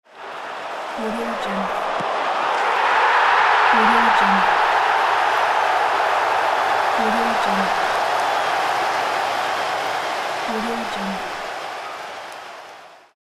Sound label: goal celebration; victorysound